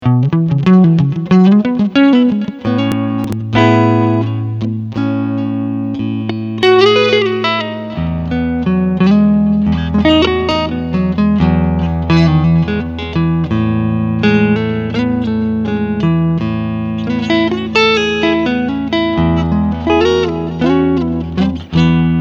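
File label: Front Singlecoil Clean Lick, guitar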